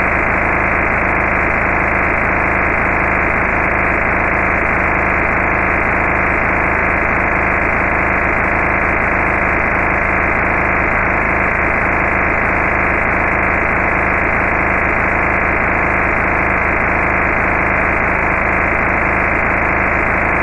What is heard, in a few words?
APCO P25 Trunking Channel Audio